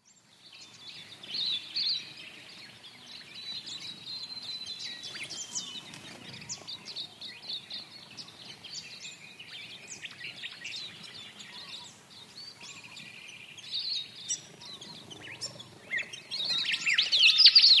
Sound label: springtime in the bush; andrew skeoch